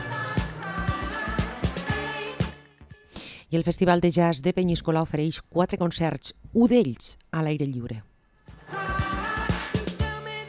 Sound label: peniscola jazz